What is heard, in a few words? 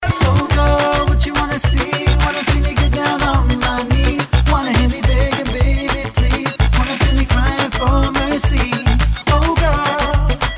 Reggae